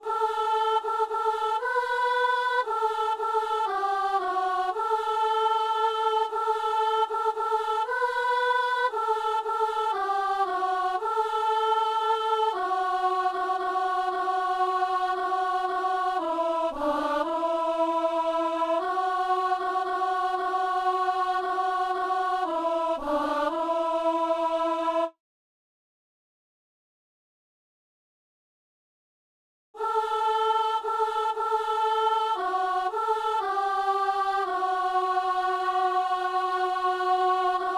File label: soprano; Gloria Patri (Palestrina); en la majeur